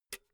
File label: pull out jack cable quick; objekte; elektronik